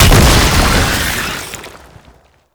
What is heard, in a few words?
Infested Spire Emerge; Enemies; Abilities; Lasrian Tank; Warframe